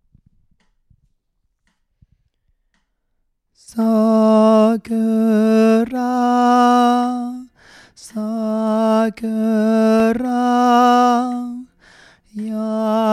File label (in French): soprano VOIX